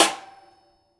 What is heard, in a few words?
Prepared Drums